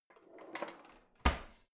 old drawer creaky in

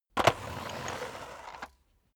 vacuum sweep forward long

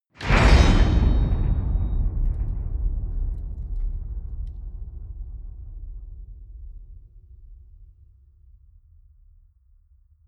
enormous metal gate clang

